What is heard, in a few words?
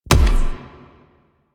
Quick Draw Punch; Warframe; The New War; Quests; Weapons; Omicrus